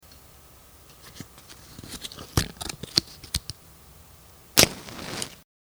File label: Lighter; The Unknown